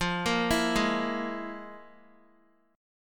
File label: F Minor Major 7th Double Flat 5th Guitar; FmM7bb5 arpeggiated; JGuitar